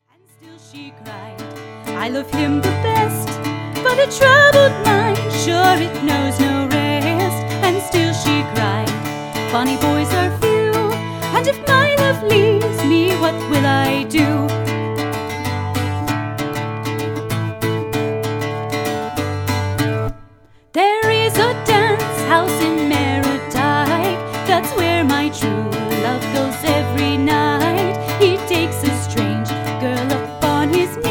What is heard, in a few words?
Irish Singer; Austin Irish Singer